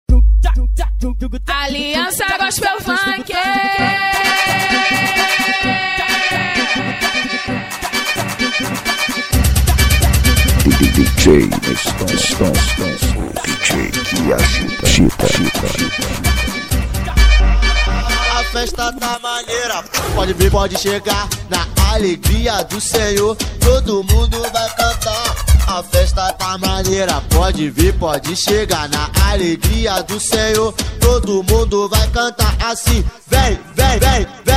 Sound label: gospel funk